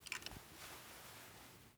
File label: reload start; Rust